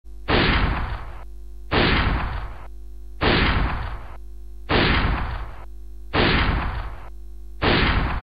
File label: shot